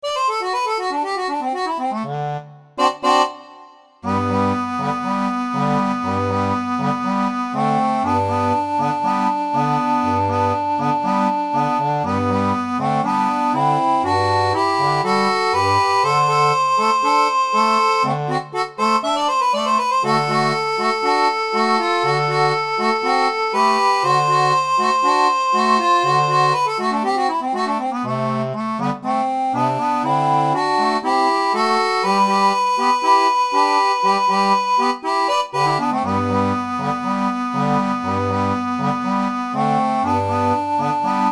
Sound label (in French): orgue barbarie; seraphone